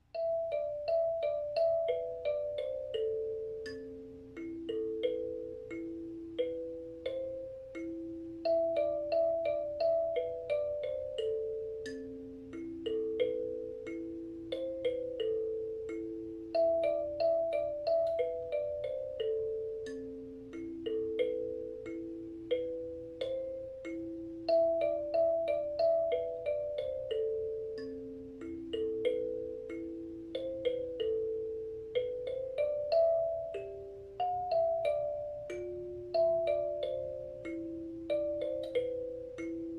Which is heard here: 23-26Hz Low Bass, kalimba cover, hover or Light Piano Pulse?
kalimba cover